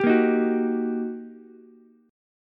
G Minor Major 7th Sharp 5th, down, Ukulele; GmM7#5 strummed; JGuitar

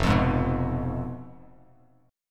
F Diminished F down Bass Standard; Fdim strummed; JGuitar

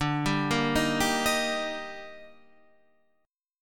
D sharp Major, Guitar; arpeggiated; JGuitar

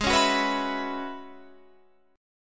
Gb 7th Suspended 2nd Suspended 4th Ab

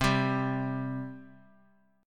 C 5th, C, down; C5 strummed

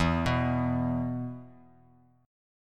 E 5th E down; arpeggiated; JGuitar

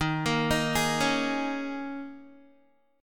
D sharp 7th Suspended 4th, Guitar; D#7sus4; arpeggiated; 4E,4A,5D,5G,5C,6F; chord shape; 11,13,13,13,13,x

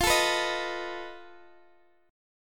F 7th Flat 5th Guitar Open D, chord; x,x,15,15,14,13

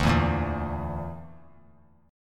D Suspended 2nd Sharp 5th D; Dsus2#5; strummed; JGuitar

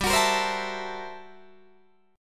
G Minor Major 13th, G, Guitar, DADGAD

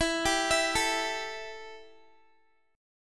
E Minor Double Flat 5th E Guitar; Embb5; arpeggiated; x,19,19,21,21,x

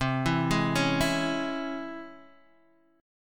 C sharp Minor Major 7th, chord

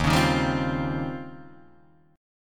Eb 13th, Guitar, Open D

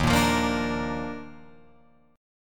D sharp Major 7th Suspended 2nd, Guitar, Open F; strummed; JGuitar

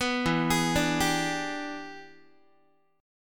E 7th Sharp 9th Guitar, chord; E7#9 arpeggiated; JGuitar

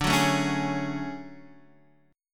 D Major Sharp 11th D Guitar Standard; strummed

